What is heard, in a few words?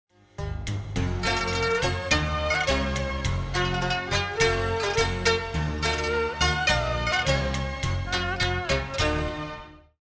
round ten sec count; music kits custom